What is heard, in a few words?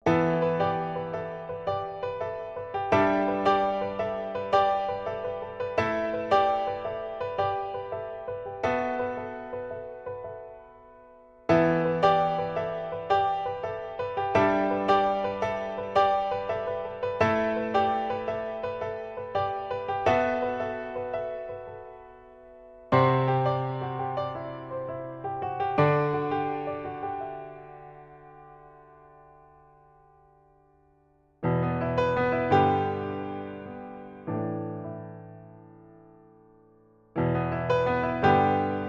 Introspective Piano Etude